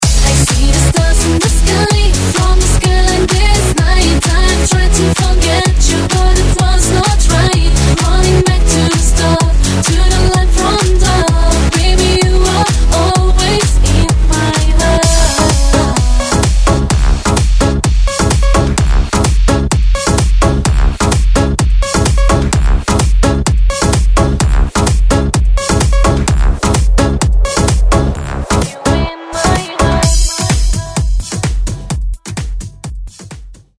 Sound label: Melody, RingTune